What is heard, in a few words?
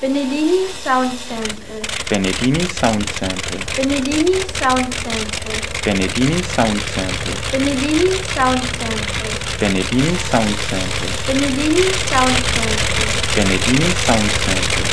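Bristol Hercules 14 cyl twin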